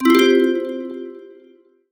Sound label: gambler win; Beauty Fruity; wazdan; ultrafast